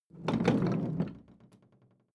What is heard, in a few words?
roll smooth; haus garten; wohnen